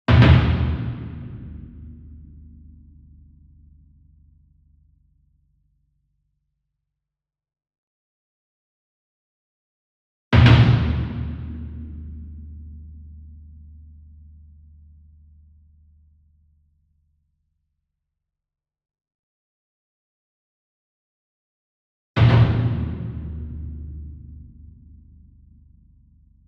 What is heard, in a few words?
SINGLE DRUM Bass Drum Concert 40 Inch Double Soft Beater Far; boom library; Cinematic Strikes Construction Kit